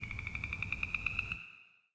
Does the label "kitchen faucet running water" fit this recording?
No